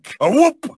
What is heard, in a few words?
awoop